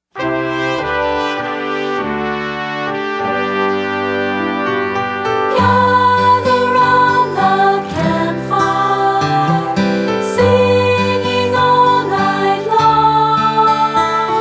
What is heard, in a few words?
campfire song